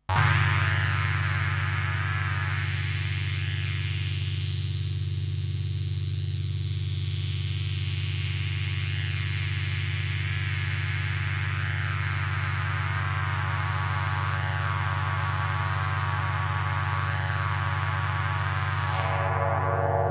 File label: yamaha cs 50